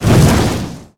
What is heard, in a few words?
whoosh fire